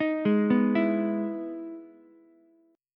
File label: E Major 7th E down Ukulele; EM7 arpeggiated; JGuitar